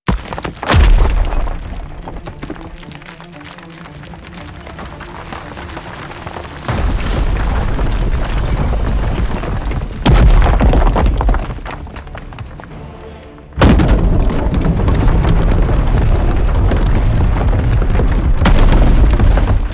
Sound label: Debris; BOOM Library